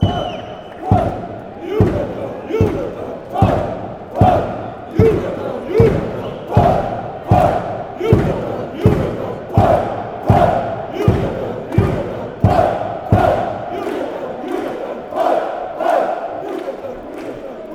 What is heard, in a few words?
chant; aalesunds